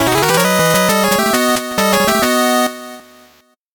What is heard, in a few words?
8bit jingle fast